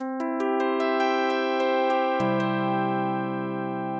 happy lilt major

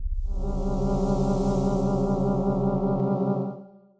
frightening stinger

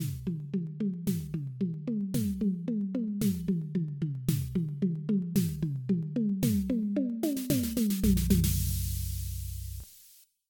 drums, mountain king; grieg

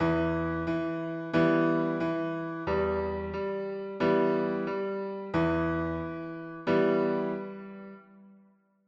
pulse with low accented notes

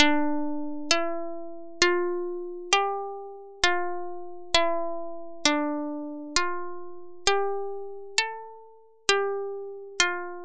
hurrian hymn lyre

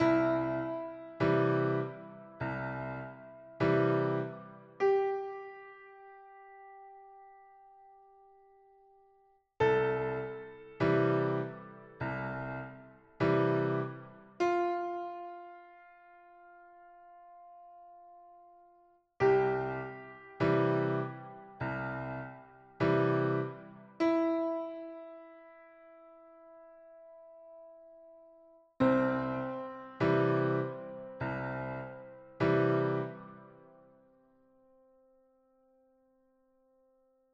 floating suspended chords

